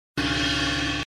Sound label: sound alert cool ringtone